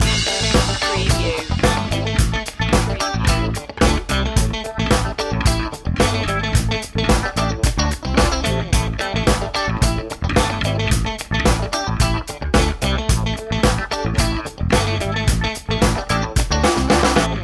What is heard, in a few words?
funk spice groove loop